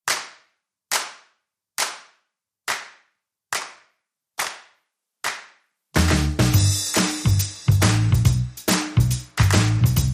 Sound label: Drums and Bass